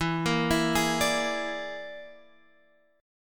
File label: A sharp 7th Flat 5th E